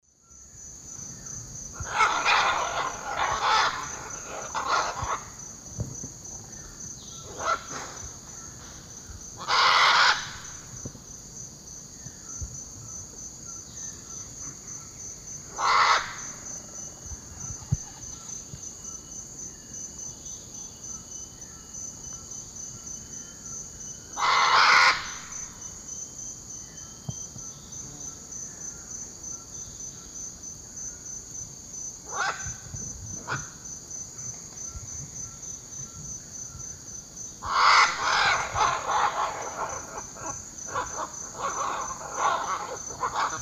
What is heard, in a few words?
Ara macao, calls; S. America; Eastern Lowlands